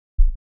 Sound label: slow heart